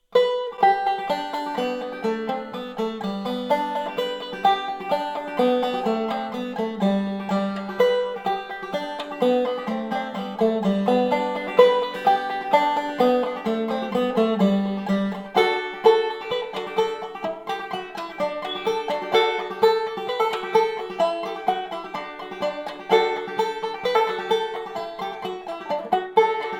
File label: banjo; Flop Eared Mule; 11" outer diameter; 2.7" depth; 0.75" wall thickness; Cooperman three-ply rim; African Blackwood